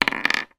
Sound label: industrial radiator valve key down on table